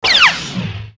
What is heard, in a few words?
sabre block